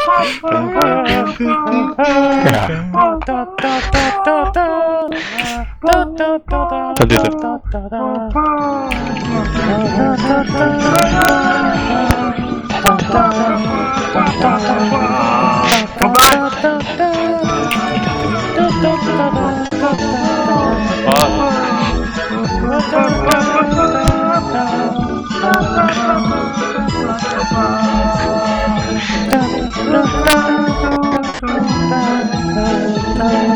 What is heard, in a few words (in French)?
fanfare des chats